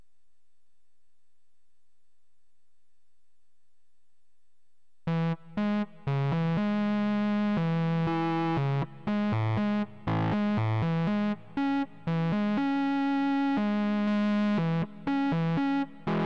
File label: Synth lead